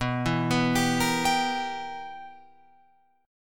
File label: B Major 7th Suspended 4th Sharp 5th Guitar Open F, chord; BM7sus4#5; arpeggiated; 11,11,11,14,13,14